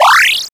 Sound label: level up